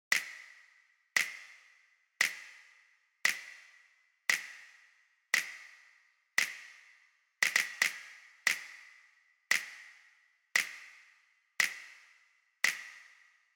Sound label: snaps